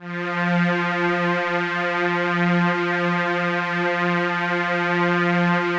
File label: FASHION F3; Drums In Your FACE!